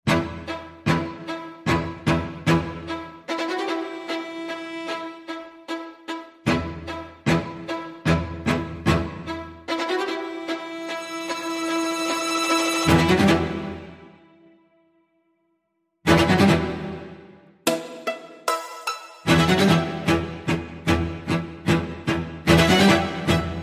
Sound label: Kingly Ticks, Chamber